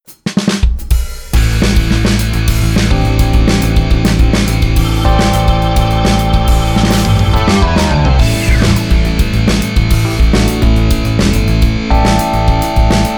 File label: Rock; ABILITY